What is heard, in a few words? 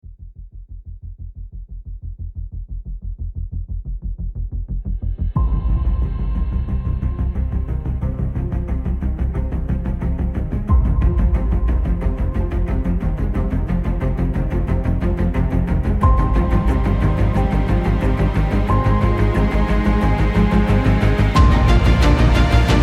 tense cinematic build